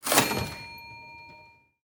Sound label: ui negative expense